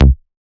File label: Raw Bass C#